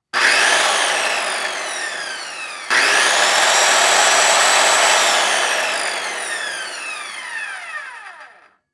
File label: Industry Tool Run Reciprocating Saw